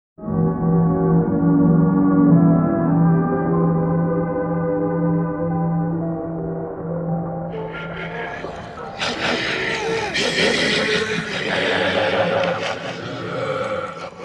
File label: Ghost In Machine